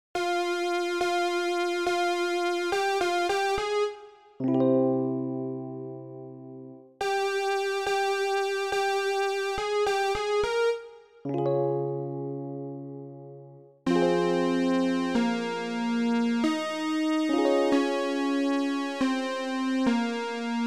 Sorrow Retro

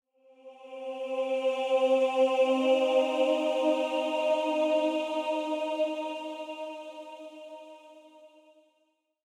amb hymns tonal; gamerip; blackfaun

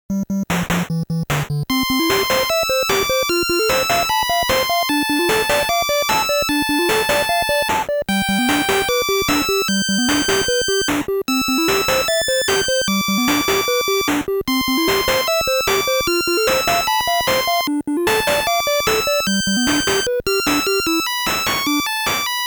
Piano Concerto #1 in B-Flat Minor; Chaikovsky's; Mvmnt. 1 (Beginning)

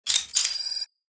Sci-Fi Weapons-Loading Fx

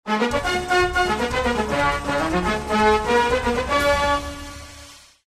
Music Race Win; Ty the Tasmanian Tiger 2 Bush Rescue; PS2

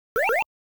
Asteroid Hit

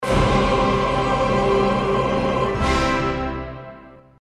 Logo (Sega CD Version); Interplay

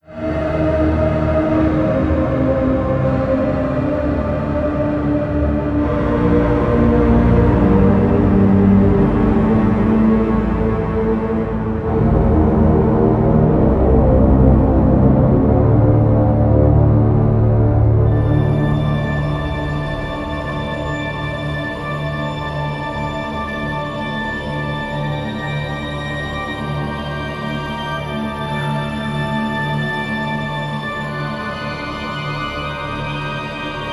Ambient caves intro; song of iron

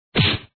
Barrier hit; Wario Gets Straight

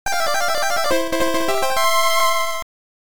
Bonus Stage Win; the cowabunga collection

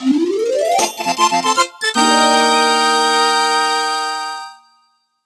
Competition Opening

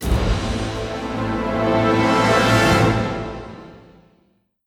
Music Jingle Tutorial Complete

